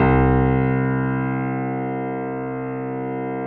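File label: grand piano C 1; USB Soundscan; AKAI; Keyboards Acoustic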